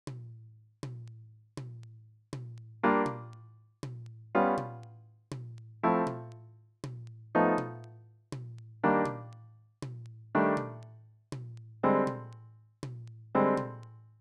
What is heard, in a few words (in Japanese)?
レフトハンドボイシング 曲